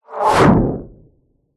science fiction space fighter zoom past ultra fast